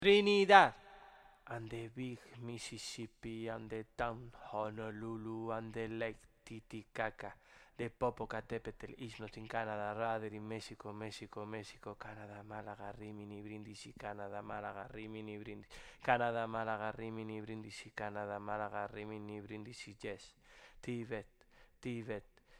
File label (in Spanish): FUGA GEOGRÁFICA TENORES